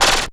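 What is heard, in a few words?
snare drum FX, Drum & Bass; Ueberschall; Drum & Bass Resonance; 168 BPM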